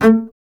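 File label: string contrabass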